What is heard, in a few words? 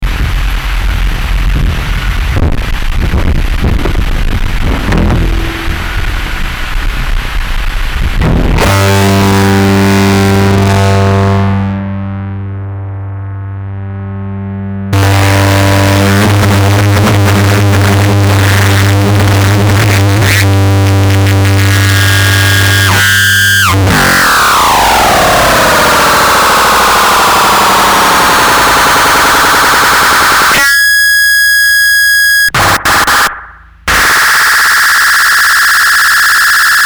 broke fuzz verb